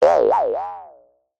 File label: cartoon boing squirrely jaw harp; zapsplat